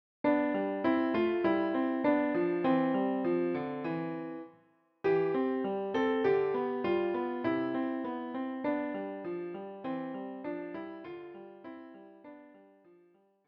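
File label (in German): Klavier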